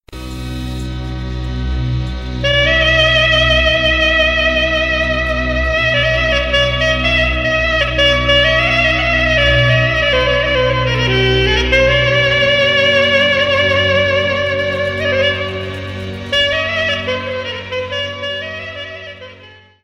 Doina, Roumanie

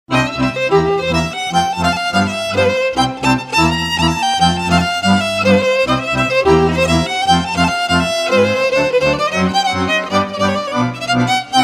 Purtata, Roumanie

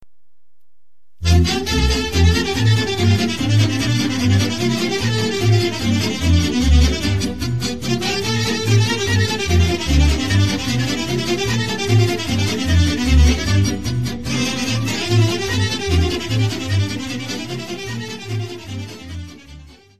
Briuri, Roumanie

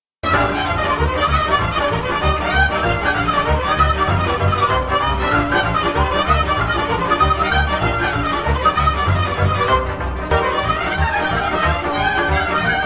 Sirba de la Closani, Roumanie

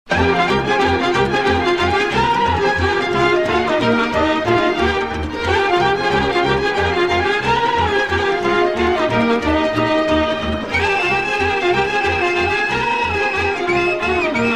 Sarba de la Boureni, Roumanie

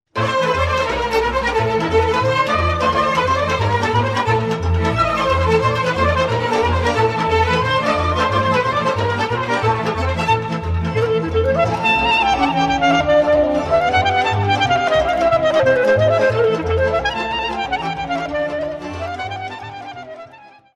Sirba de la Iasi, Roumanie

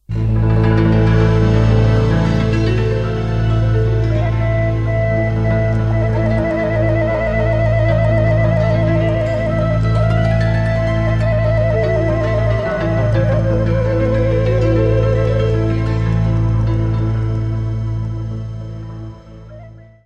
Suita olteneasca - Roumanie

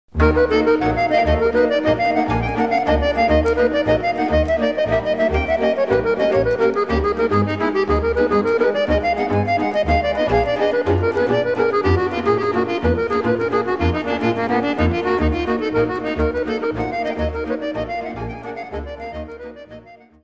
Geamparalele Dobrogean, Roumanie

